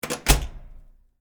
Door Close Exit, Foley, Door, Close, Exit, Push, Bar, Slow, Office; Miscellaneous Door